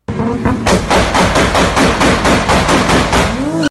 Supra Exhaust

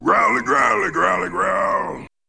Growly

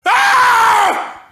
very mad scream

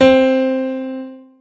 Piano; Block craft 3d

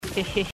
heheh

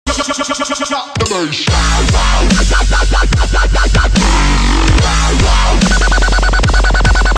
drop the bass sound effect

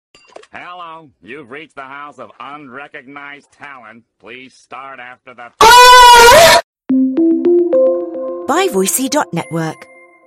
moan; spongebob

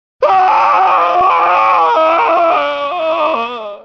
scream

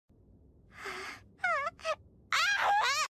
hhuh uhhh arhuuaahhh

